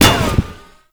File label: Tank Shield Impact; Enemies; Gameplay; Lasrian Tank; Warframe